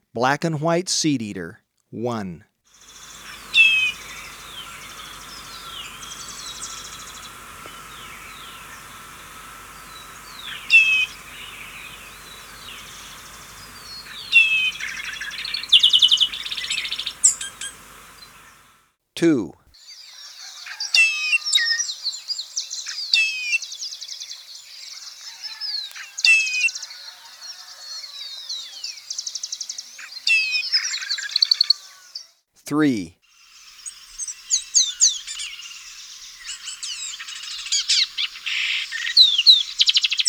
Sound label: Black-And-White Seedeater, Birds of Ecuador; S. America